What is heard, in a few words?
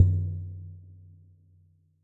paytable Button; fireflies